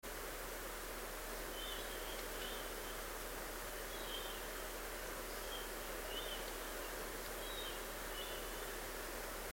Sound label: marbled murrelet